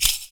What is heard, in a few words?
FOOT PERC; Univers Sons - Raricussions